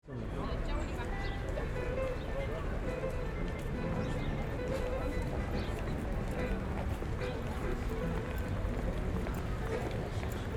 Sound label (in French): Souterrains Halles Parcours Porte du pont neuf porte ST eustache